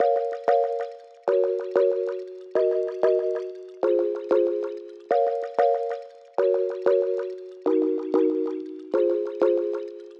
Pluck Chords, Wet, 94, Am

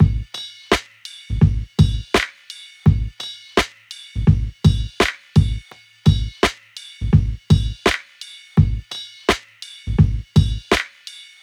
84 Drum Lp

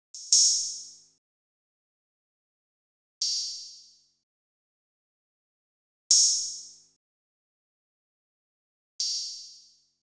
166 Cm open hat

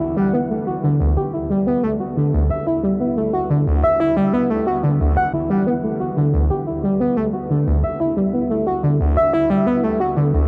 SYNTH D 90

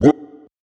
Vocal

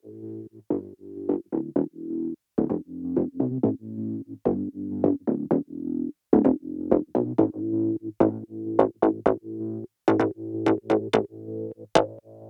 Mid Bass Build 128 BPM DRY

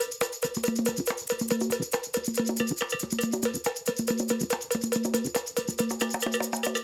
140 Beat Just Perc

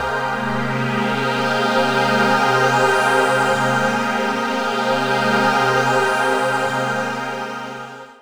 CHORD G

